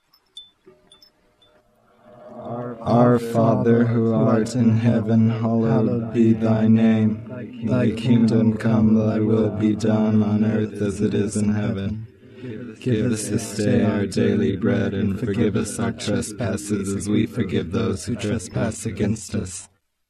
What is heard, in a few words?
prayer reverse; backmasking contrario